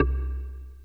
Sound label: down; ADS Tech